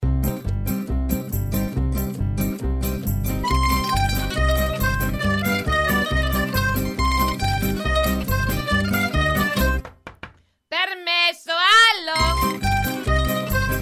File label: tarantella